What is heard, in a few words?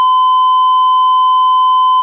beep